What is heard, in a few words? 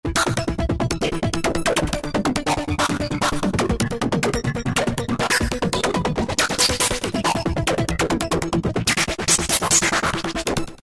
Korg microKORG Noize Blasts; Factory Voices